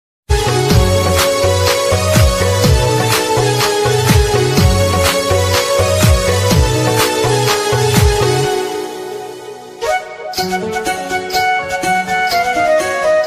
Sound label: punjabi ringtone hindi ringtone love ringtone sms tone mobile ringtone